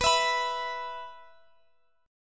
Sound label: E 5th B